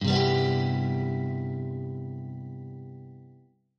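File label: C maj7, Guitar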